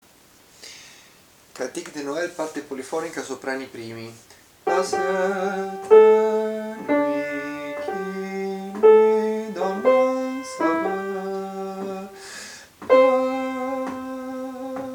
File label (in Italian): soprani I; Cantique Adam